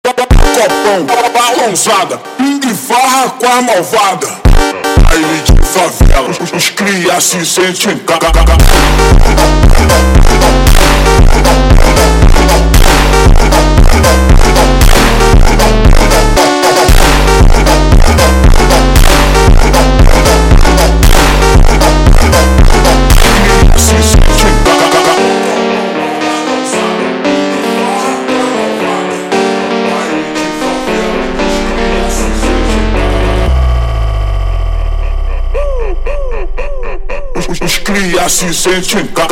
Funk slowed

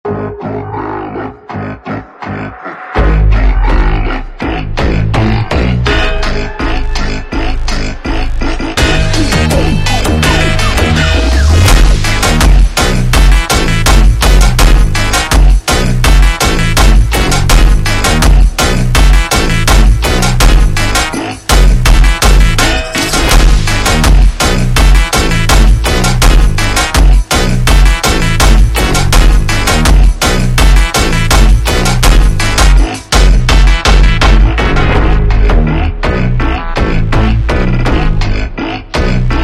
Montagem Super Slowed

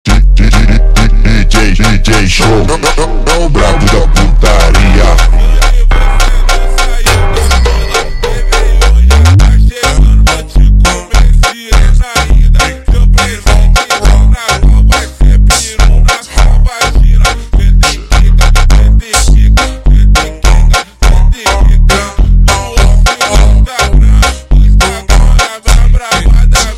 Funk super Slowed

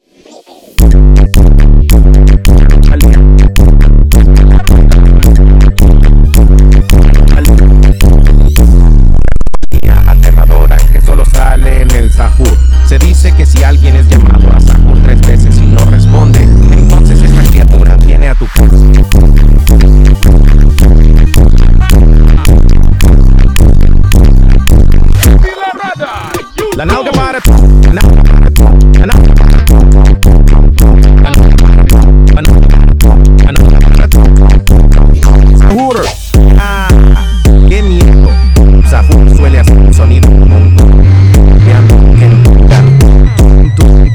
Superbass

